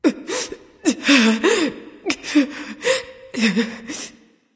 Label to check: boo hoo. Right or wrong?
Right